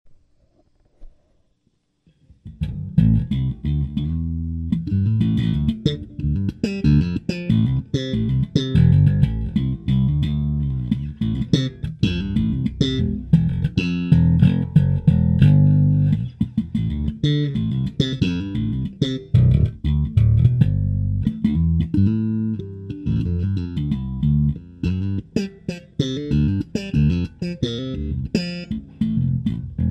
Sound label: Bass slap